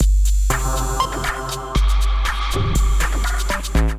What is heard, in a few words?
Kit, Drums; Aero Kit; Ableton; Skitter and Step